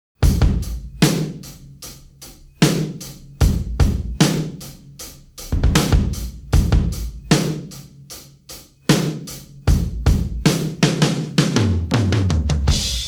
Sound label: intro, drums